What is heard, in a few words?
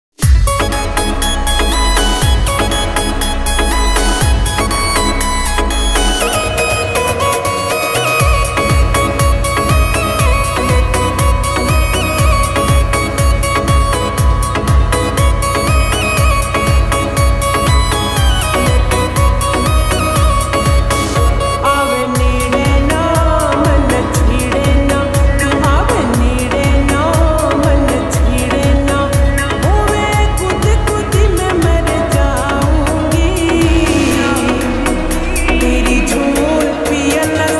haryanvi song slowed reverb